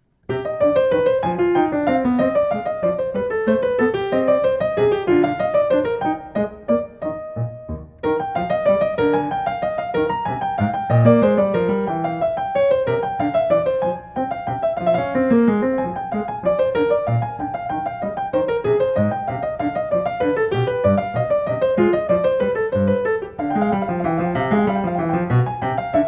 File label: French Suite C Minor; Bach, French Suite in C Minor, Menuet I